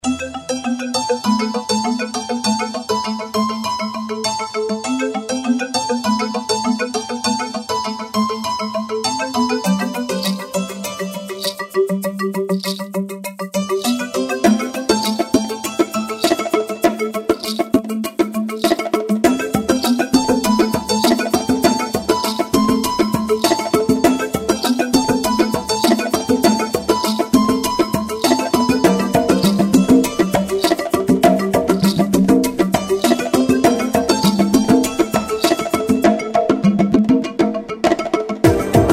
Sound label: danceambient